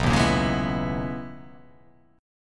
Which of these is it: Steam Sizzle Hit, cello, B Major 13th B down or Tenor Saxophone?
B Major 13th B down